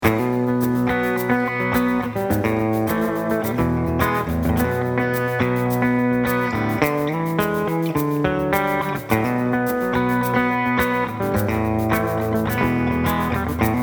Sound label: flute